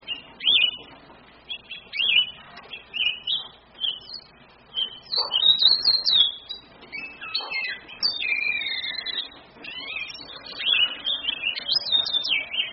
ciuffolotto messicano

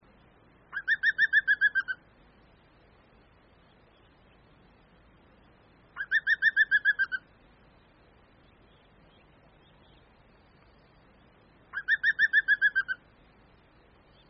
picchio verde

